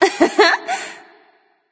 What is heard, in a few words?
hehheh; smite; voicepack; jingwei